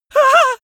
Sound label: male afraid squeal; vocals; comedy-cartoon; soundpool